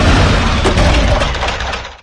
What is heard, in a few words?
Vehicles; Scorpion